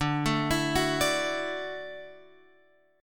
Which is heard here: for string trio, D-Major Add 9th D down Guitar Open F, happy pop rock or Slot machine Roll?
D-Major Add 9th D down Guitar Open F